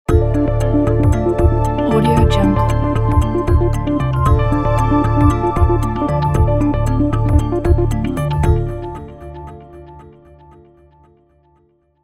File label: news logo